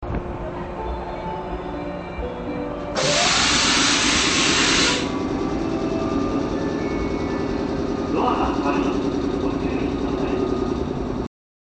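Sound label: omika, departure bell; zyoban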